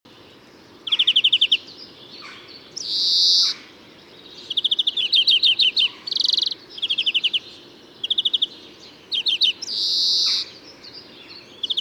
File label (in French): verdier chant